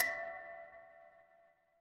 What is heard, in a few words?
instrument singing bowl